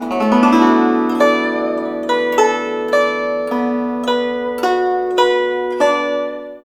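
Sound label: guzheng; Spectrasonics; Heart of Asia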